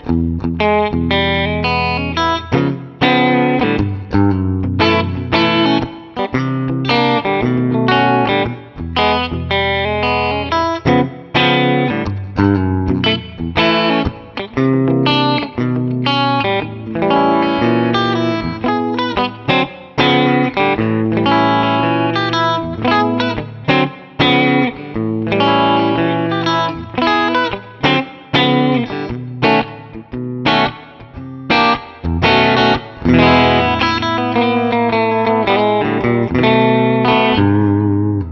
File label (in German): Paul Reed Smith Beide PUs Humbucker Split clean; Thomann 70th